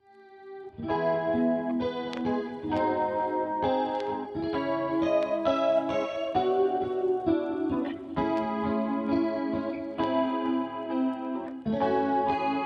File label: instrumental lofi